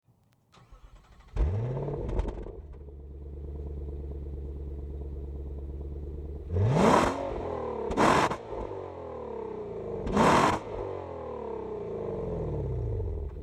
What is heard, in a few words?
Infiniti FX50S